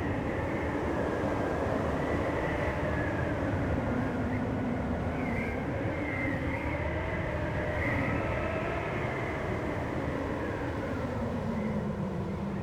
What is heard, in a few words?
atmos blizzard high; Hollow Knight: Silksong